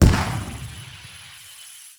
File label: Explosion; Mischief Rework; Warframe; Mods; Pet Mods